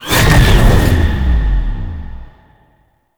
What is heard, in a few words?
Train Portal Open Stinger; ambience; Warframe; Entrati